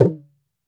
gumki, mrid

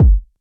kick; eddyflux; crate bd; dough-samples

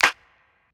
snare; dough-samples; eddyflux

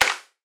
clap; eddyflux; dough-samples

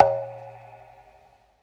conga session perc; eddyflux

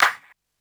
clap; eddyflux; dough-samples

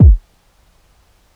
kick; eddyflux; crate bd; dough-samples

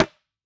dhi, mrid

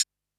closed hh; eddyflux

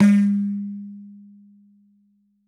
dhum, mrid

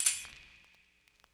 tamb; eddyflux

